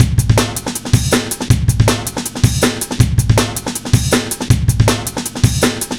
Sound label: 160, drum loops, drum & bass; Total Drum&Bass